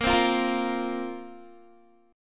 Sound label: A sharp Suspended 2nd down Guitar; A#sus2 strummed